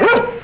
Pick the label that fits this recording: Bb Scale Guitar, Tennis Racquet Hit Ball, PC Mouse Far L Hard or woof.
woof